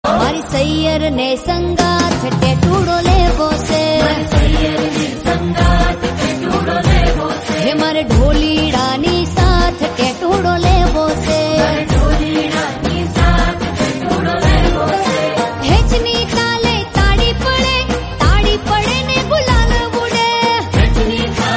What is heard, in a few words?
gujarati song